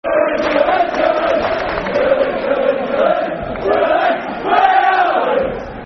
We Are Leeds, We Are Leeds Football Chant (Soccer Song); fanchants; Leeds Lufc